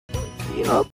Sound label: marsupial gurgle esque sound